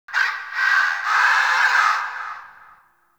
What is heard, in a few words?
Night Bird Screech; Warframe; Eidolon Plains; Ambience